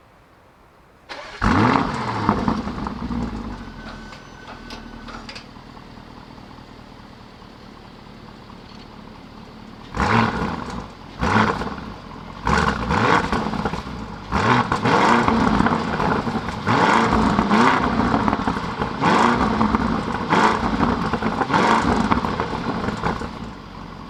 lamborghini urus

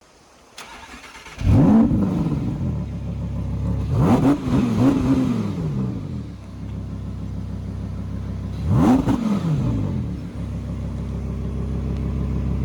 audi r8

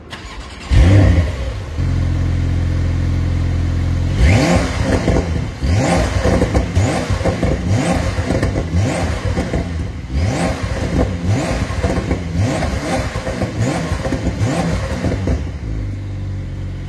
porsche 911 turbo s

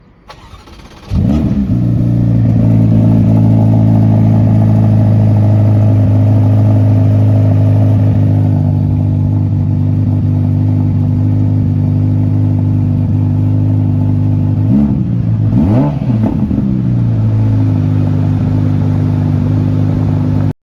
ferrari portofino

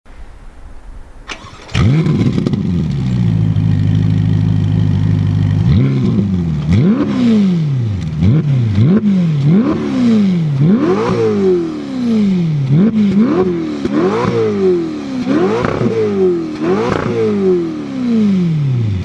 ferrari roma

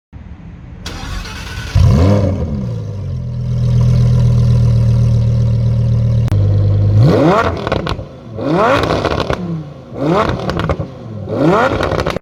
lamborghini huracan evo

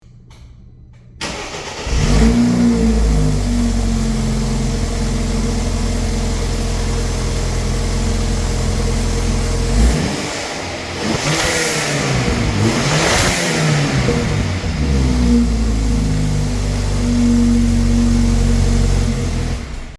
bmw 420

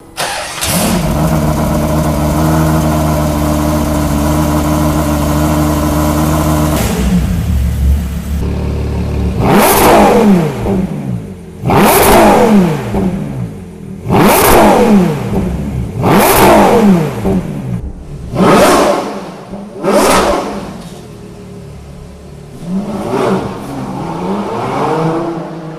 ferrari purosangue

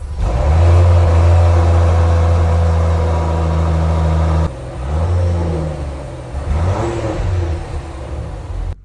land rover defender p400

